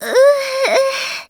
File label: dizzy; celica